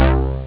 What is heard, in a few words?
Korg Bass